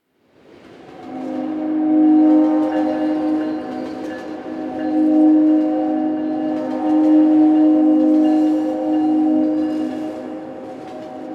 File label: Distant Train; Warframe; Ambience; Nineteen Ninety Nine